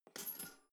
toaster pull up soft

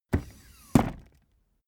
office chair hydraulics up

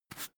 broom hand sweep short

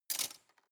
cutlery large drawer rattle soft

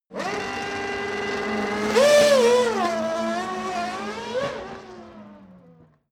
racing car f1 accelerate kick down

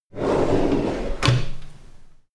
closing drawer

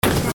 noisy impact

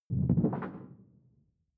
sci-fi alien bubble

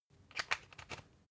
usb port metallic soft in

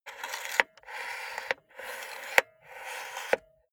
cassette player antenna extend slow

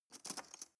cutlery teaspoon drawer grab soft

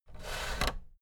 fader plastic scrape double down medium

